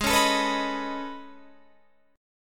A Major Sharp 11th, G sharp

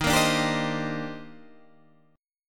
D sharp Major 13th, down, Guitar, Standard; strummed; JGuitar

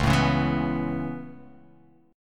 C sharp Minor 7th Sharp 5th C sharp

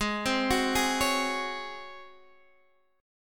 Db Major 7th Suspended 4th Ab

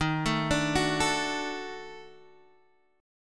D sharp Minor Major 7th Flat 5th, Guitar; D#mM7b5; {X 6 7 7 7 5} arpeggiated

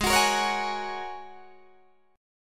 Ab 9th Suspended 4th Ab down Guitar DADGAD; Ab9sus4 strummed

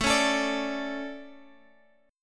A Major Add 11th A, chord; Aadd11; strummed; JGuitar

